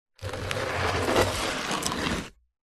foley wooden box large slide gritty concrete

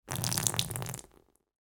water spill carpet floor

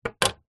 household kitchen knife set down on wood table

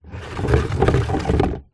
household plastic bucket empty drag on wood floor

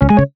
app alert tone

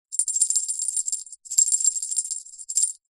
bell very small shake ring

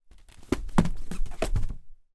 toy doll fall down stairs

